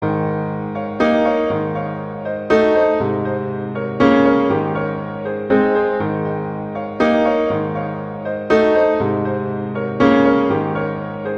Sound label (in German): Walzer; Schumann; Albumblatter